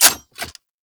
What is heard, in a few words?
Reload C B; Warframe; Weapons; Zylok Prime